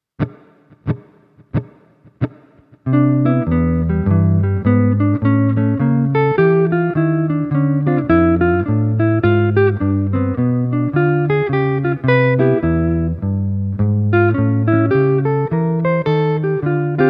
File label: jazz phrase, guitar